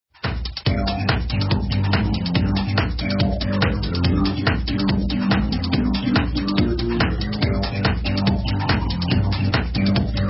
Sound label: Techno Music